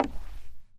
wood footsteps; zps; Foley

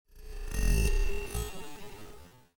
metal scrape; zps; Ambient

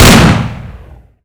winchester fire double, Double Barrel Shotgun; zps; Weapons; Firearms

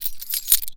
Keys Pickup; zps

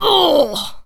pain, Zombies; zps; Z Waitress

